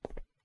Footstep On Stone

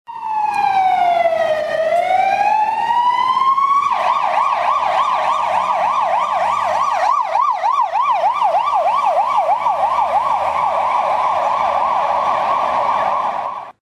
Ambulance Sirene Spain Almeria

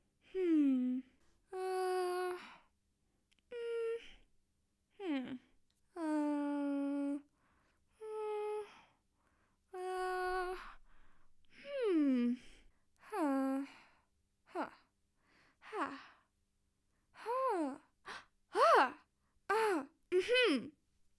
Woman Thinking

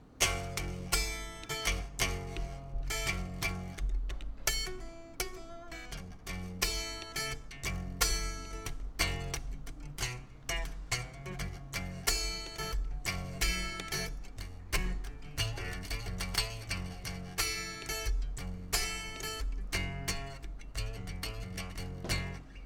Guitar

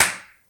Clap

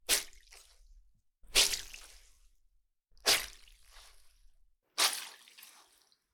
Blood Gush

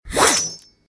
Slicing Through Flesh

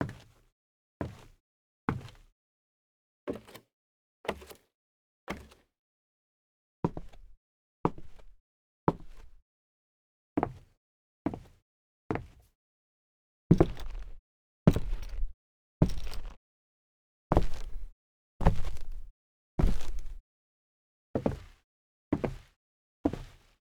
Footsteps Wood Walk

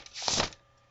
New Mail

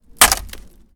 Snapping Wooden Fence

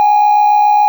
Radio Tone

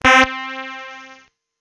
Trumpet

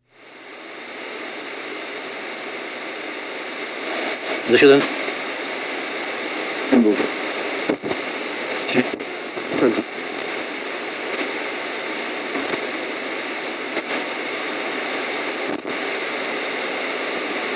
Radio Tuning Fm

